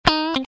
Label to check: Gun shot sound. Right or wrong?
Wrong